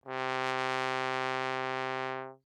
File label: Tenor Trombone ff C3